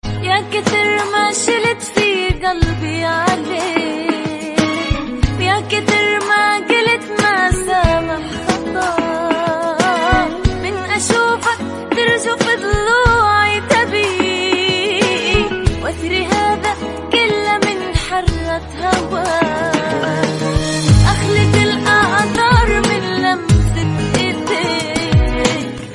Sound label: arabic ringtone